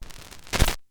vinyl; Masterbits; Vinyl Attack

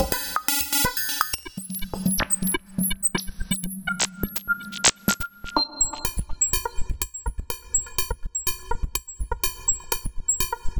buggin, Loops; Polyend; Loops n trouble

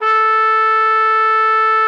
TROM A4, TRUMPET; Xsample VOL-3

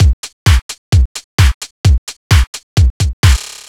Drums, 130bpm, Nu Dance Electro; Zero-G; Soundclash; Combi Loops; After Eights

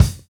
Chamber Kick; Sonic Foundry (Sony Creative Software); Drums & Drones; Construction Kits

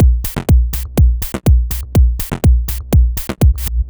123bpm, House Drumloops; Mint; Zero-G House Fabrik Drumloops

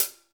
CYM 14, Real HiHats, 14 Hi Hat

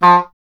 E. Horn

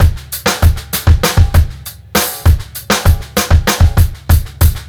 beat, Roots Funk; USB Soundscan vol.41; AKAI

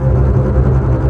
trem bass C2; E-MU Formula 4000 Series Vol. 5; Protozoa; Proteus2 Presets

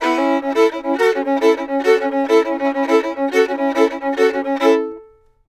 FIDDLE; Sampleheads; Whole Lotta Country 1 & 2

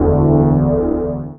SHORT, SHORTNOIS

